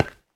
骨块踩踏声

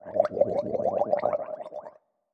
水中待机声; 溺尸

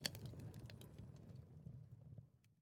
熔炉燃烧噼啪声

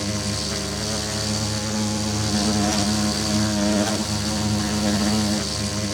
蜜蜂飞行循环声

